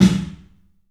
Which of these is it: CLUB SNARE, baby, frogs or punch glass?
CLUB SNARE